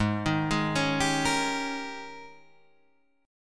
G sharp Major 7th Suspended 2nd Suspended 4th Guitar Open G